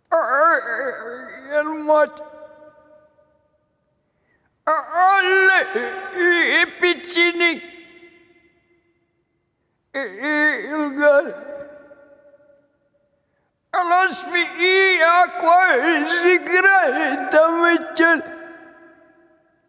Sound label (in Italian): voce